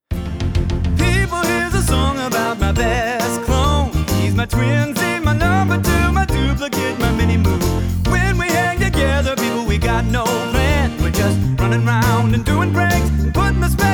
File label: Alt Vox Full Mix; Best Clone